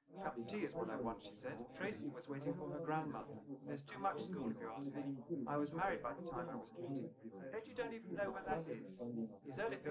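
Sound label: male, live recording 250ms 5cm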